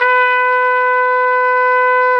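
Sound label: BRS RCA TP, Brass Trumpet; Roland L-CD702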